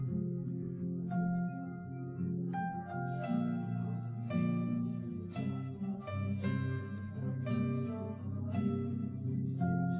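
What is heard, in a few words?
no drums, live recording 250ms 5cm; SiSEC11; underdetermined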